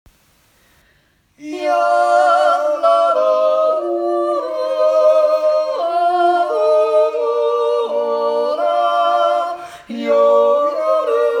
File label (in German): Jodel